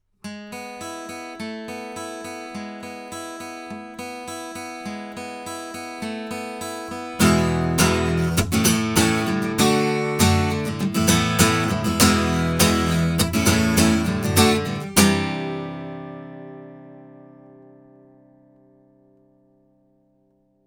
guitar Gibson, microphones, Milab VM-44

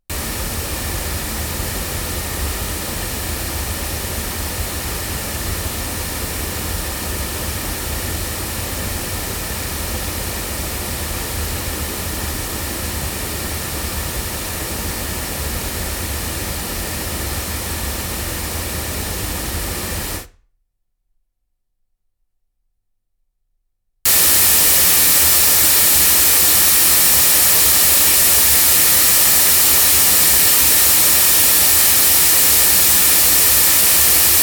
loudspeakers

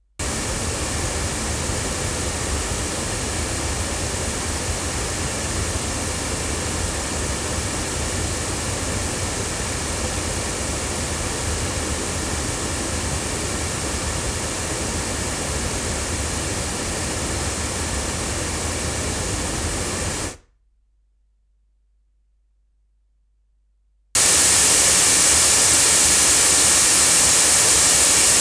sE 2A hypercardioid loudspeakers, microphones; se electronics

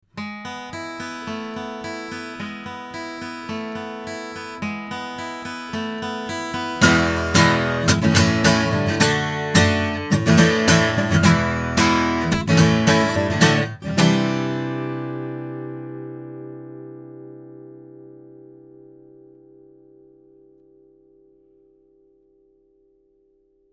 AudioTechnica AT2035 guitar

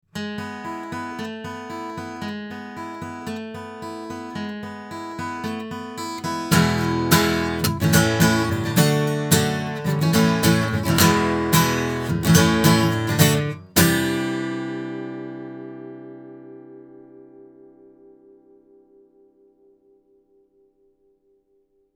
cardioid guitar; Yeti Pro; BLUE

